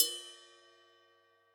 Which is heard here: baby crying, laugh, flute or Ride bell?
Ride bell